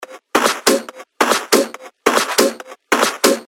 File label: 140 BPM, techno loops; Federale; Revealed Techno Top Loops Vol. 9